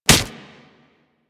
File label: Melee Fist Impact Generic; Warframe; Weapons; Fists